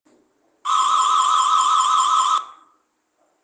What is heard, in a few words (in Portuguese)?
sonica; 27C10 NOBREAK; sirene